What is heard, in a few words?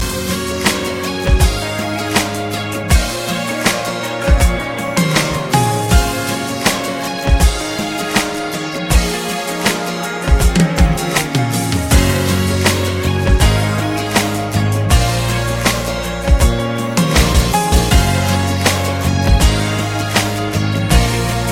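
pop music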